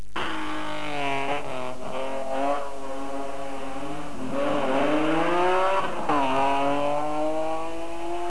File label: m3, BMW M3 groupe N; gassin